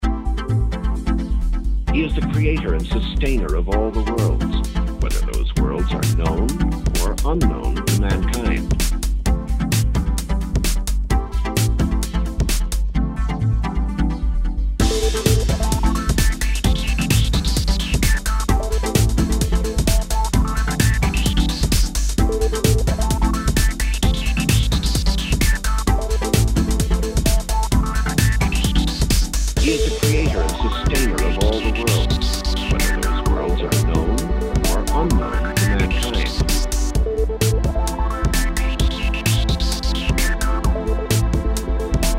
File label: techno pop